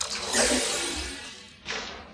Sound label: door; sona